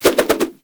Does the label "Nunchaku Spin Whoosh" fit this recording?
Yes